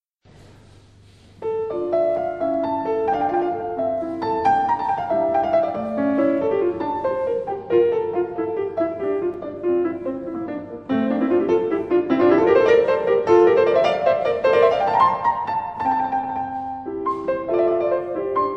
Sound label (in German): Scarlatti Sonate d-Moll